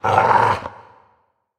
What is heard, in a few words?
Dog Sniff Prisoner; Prison Architect